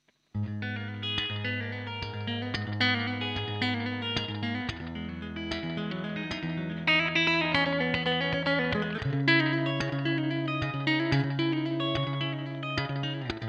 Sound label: Sabre Clean ch; sabre signature 50 combo